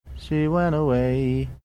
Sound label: singing